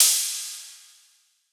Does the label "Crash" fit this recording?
Yes